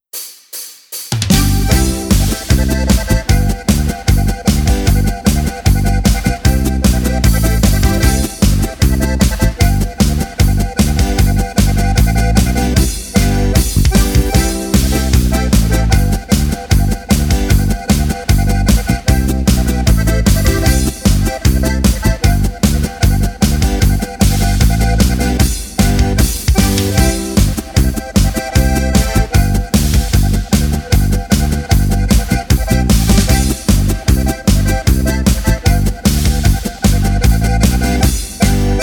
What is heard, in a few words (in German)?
Polka G C F B mit Harmonika Begleitung Hoerprobe